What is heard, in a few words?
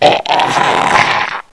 demon death